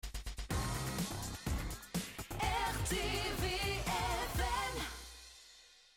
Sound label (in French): Jingle Chanté; RTVFM; mac do